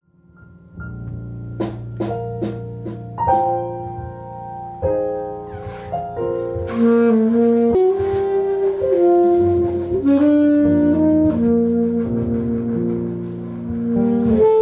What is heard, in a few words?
sax session